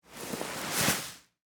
Sand Bag Pick Up; BlastWave

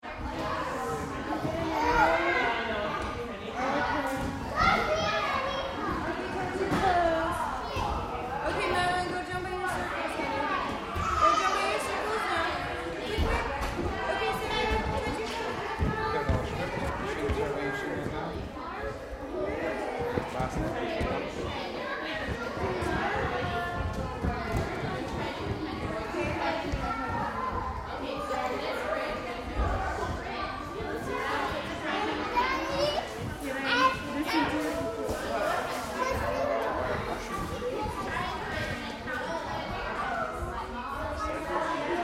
Crowd Kids Gymnastics Busy; Miscellaneous Sport Sound Effects; BigRoomSound

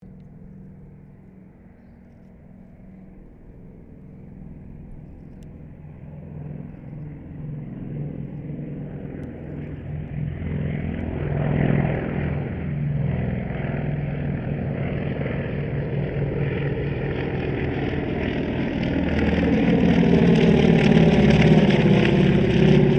Ceres Winjeel Wirraway pass, stereo; Mixed aircraft squadrons; Airplanes; Vehicles

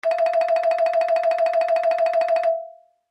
Xylophone Trill; Xylophone Trill Chromatic; Mallets; Blastwave

